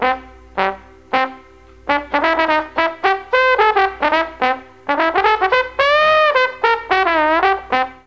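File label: E4 D BONE, mellotron trombone; DIXIE+TRMBN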